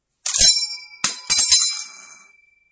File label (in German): M1 Garand Klingelton